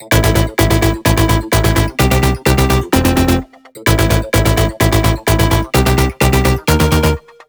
128BPM Dumbflange Melody; Vengeance Samples

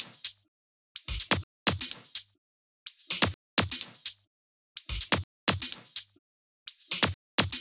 126BPM Kit; VFH1 126BPM Comeback Kit

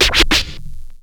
Scratch In, vinyl record

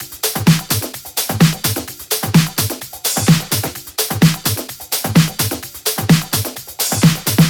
128BPM Latin Kit; Latin E Kit

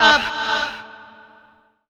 Vocal Oneshots; Vengeance Samples; Vocals

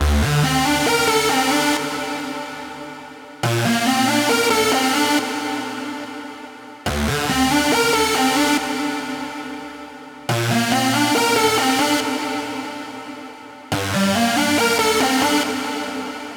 Mainlead WET 140BPM, Dminor; Bassline & Melody; Vengeance Samples; Beast Kit

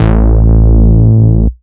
Bass Root A

